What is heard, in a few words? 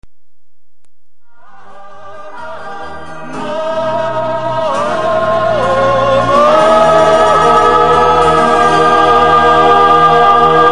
tune; Fleurop